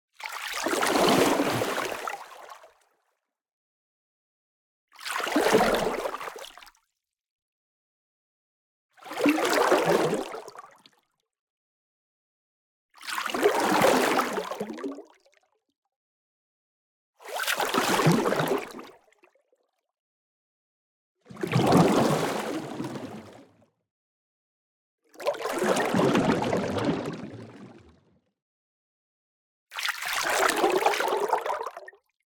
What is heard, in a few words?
Water Movement Soft Movement, Water in Motion; Justsoundeffects